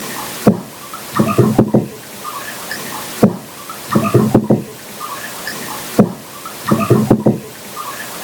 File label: sequential knock; bena 430am 23